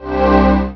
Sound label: ui hum; vampire demo